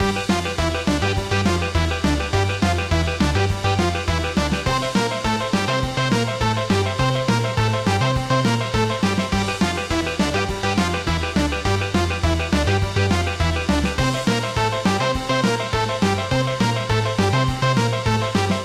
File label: BGM Space Train Fever Time